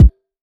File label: SK, Kicks